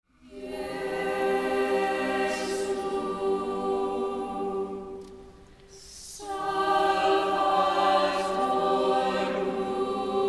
Schola; Live Rec. (Low Mass [Mostly-Teen] Schola); “Jesu Salvator Mundi” • Low Mass (mostly-teen) Schola, Ave Maria Catholic Church

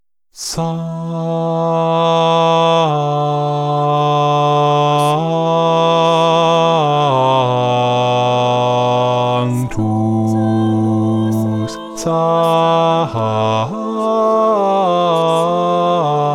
BASS; HOSANNA Cappella Sistina MS 17 “Missa Mille Regretz” (Morales); cristobal morales

